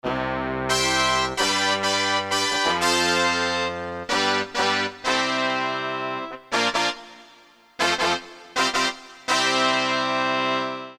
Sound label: ENSEMBLE, Korg DSS-1